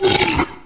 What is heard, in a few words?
burp short pain